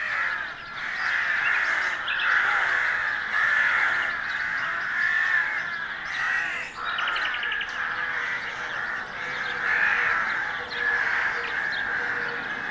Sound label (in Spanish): amb grajas medio